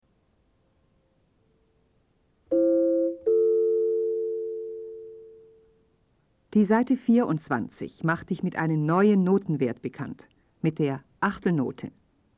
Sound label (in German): Spiel Blockfloete